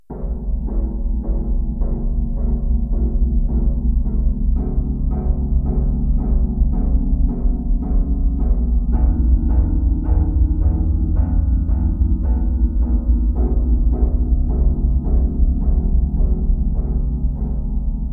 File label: creepy Drums